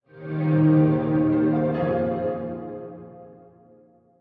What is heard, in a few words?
recall mus; smite; hades